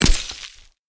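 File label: ice prick, attack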